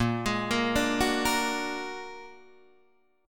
A sharp Augmented 7th A sharp Guitar Open D

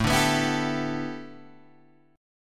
A 7th Suspended 2nd Suspended 4th Guitar Open G

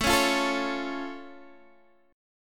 A Augmented A down Guitar Open D

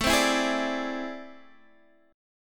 A Minor Major 11th

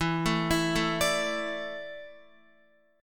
E 7th Suspended 4th, Guitar; arpeggiated

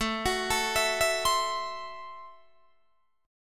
A Minor 6th A Guitar DADGAD

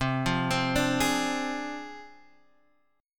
D Minor 7th Flat 5th C, guitar chord

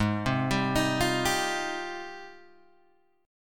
Ab Major 13th, Guitar, Open G

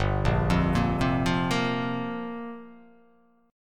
G Minor 11th, chord; Gm11 arpeggiated; JGuitar

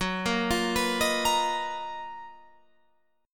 Gb Major 13th Guitar Open F; GbM13 arpeggiated

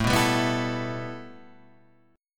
A 7th Suspended 2nd A; A7sus2 Chord {5 2 5 4 5 5} strummed

